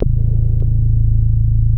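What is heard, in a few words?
wall moog, bass; Spectrasonic Distorted Reality 1; WALL MOOG BS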